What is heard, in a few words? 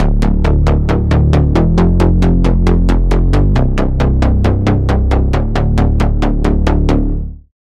Bass, Spire; Orbital Station; Routed Sound; Spire Expansion; Reality Maker Vol.1